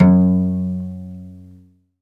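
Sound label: SOLO CELLO, Synclavier Strings; ILIO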